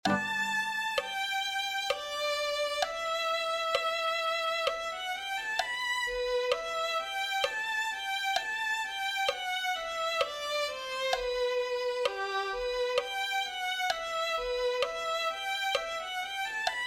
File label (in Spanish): gracia oh dios violin